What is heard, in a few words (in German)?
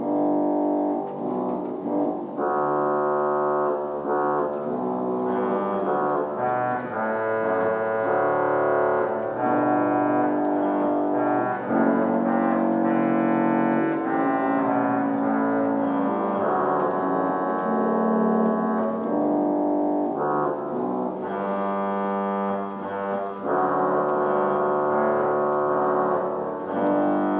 posaune, orgel